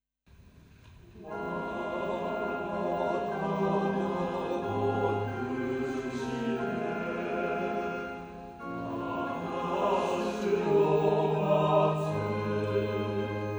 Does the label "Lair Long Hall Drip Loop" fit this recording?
No